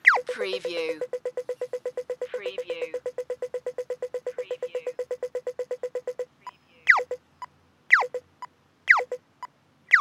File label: singapore crosswalk beeps loop